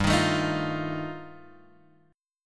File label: G 7th Suspended 2nd Sharp 5th G chord; G7sus2#5 Chord {3 X 1 2 4 1} strummed